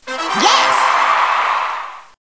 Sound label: success